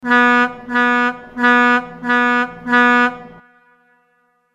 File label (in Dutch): herhaalde stoten